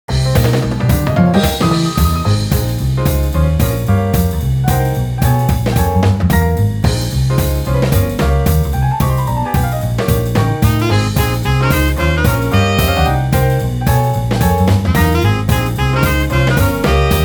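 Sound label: live house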